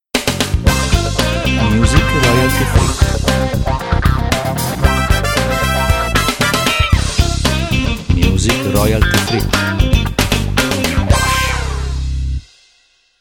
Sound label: Funk